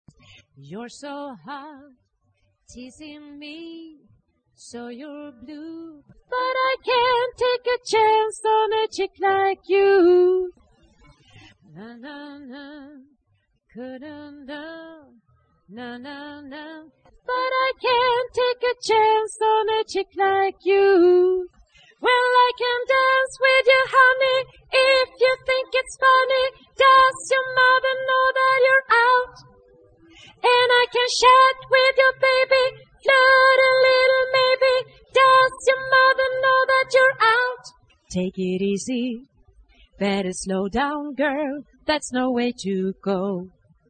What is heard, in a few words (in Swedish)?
bas